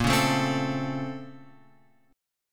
A sharp Minor Major 9th; A#mM9 Chord {6 4 7 5 X 5} strummed

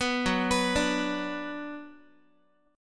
F sharp Suspended 4th Sharp 5th, Guitar; arpeggiated; 14,14,14,12,x,x

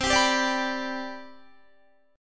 C Major 7th Suspended 2nd, C, down, Guitar; strummed; CM7sus2

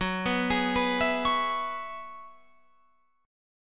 F sharp 9th Suspended 4th, down, Guitar, Open F; F#9sus4 arpeggiated; chord shape

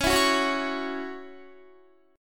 Db Minor Double Flat 5th Db Guitar; strummed; JGuitar

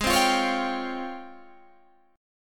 Db Major Sharp 11th Ab

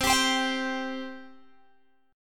C 5th, Guitar, Open G

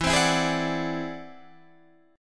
E Augmented 9th E down Guitar Open D

